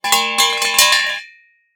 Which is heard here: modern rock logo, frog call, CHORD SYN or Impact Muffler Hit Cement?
Impact Muffler Hit Cement